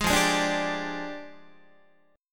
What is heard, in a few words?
F Minor 6th Add 9th F down Guitar; JGuitar